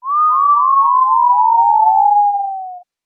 whistle; dutch; taunts

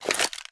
glock draw, weapons

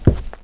carpet; valve

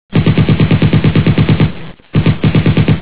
plane gun; valve; kong

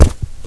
concrete; valve

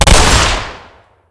weapons; dbarrel

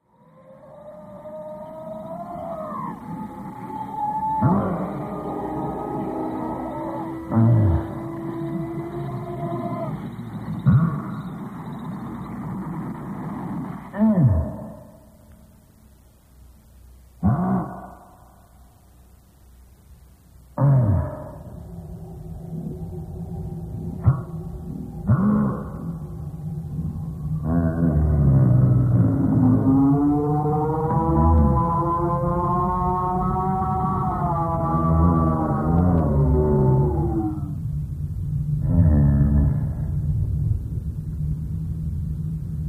monster grunt; valve; nite2

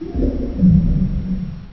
bloater idle; valve

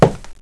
pl wood, player; valve

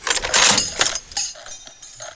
gauss clip out, weapons; valve

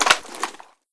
egon draw

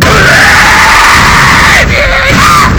scream; nightmare

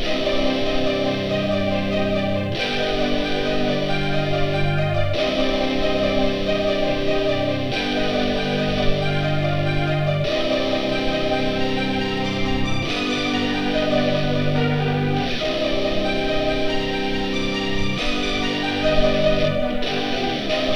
death clock; valve; dream

